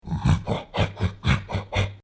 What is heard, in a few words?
ringtone; barbarian